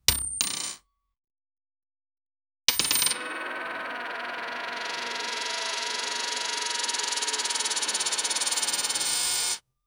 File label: pixel